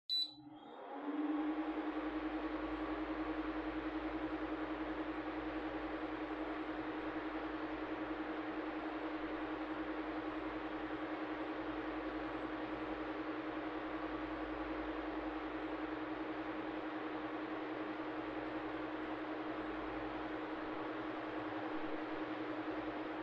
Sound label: Electric Fan (Far-Mid)